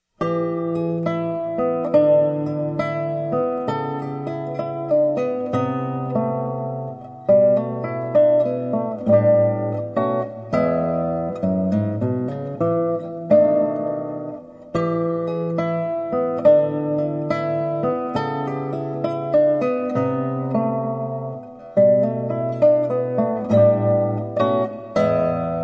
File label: Guitar Boogie